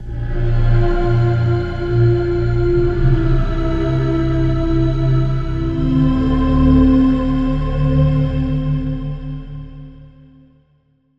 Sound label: Pad; Routed Sound; Serum 2 Expansion - Reality Maker Vol.1